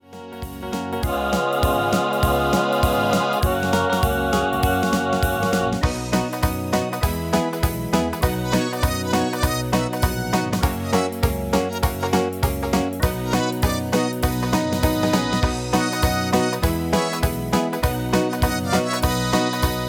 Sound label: MIDI